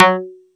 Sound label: Funky Pick f#3, Yamaha YX81Z